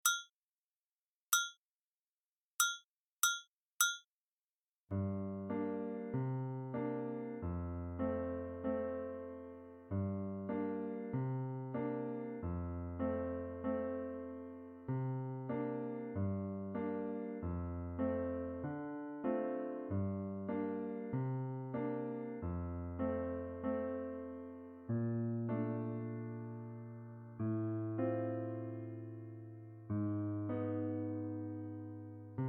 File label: Bass Acc